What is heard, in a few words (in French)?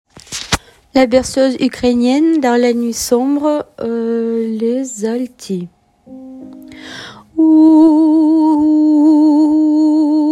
altis, Berceuse ukrainienne